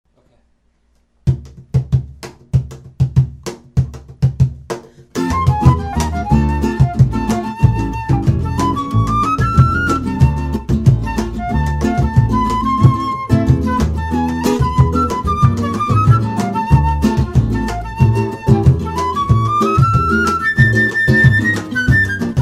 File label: Latin Ensemble, Flute